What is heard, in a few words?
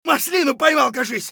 scav wound; eft